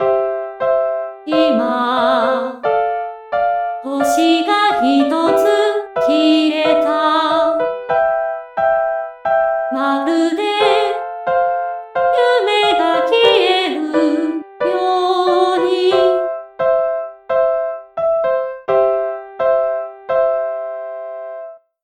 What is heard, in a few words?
voctune piano